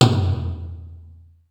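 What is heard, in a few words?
low long tom; sr16 tom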